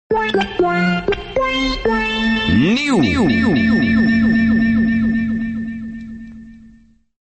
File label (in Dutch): nieuw sms, ringtone